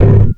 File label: glass pane knock; physics; Portal 2